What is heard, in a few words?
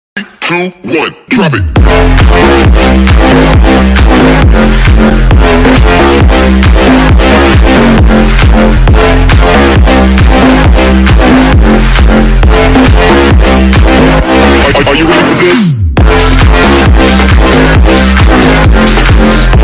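BASS BOOSTED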